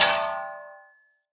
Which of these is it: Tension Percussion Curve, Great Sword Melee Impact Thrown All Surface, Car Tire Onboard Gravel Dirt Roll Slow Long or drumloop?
Great Sword Melee Impact Thrown All Surface